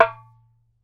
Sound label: darbuka note